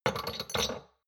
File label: shot glasses place multiple soft; soundpool